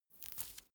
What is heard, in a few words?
mandarin slice separate